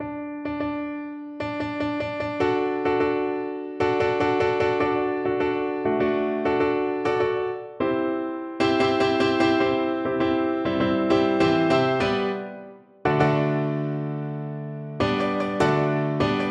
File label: aida march pno, piano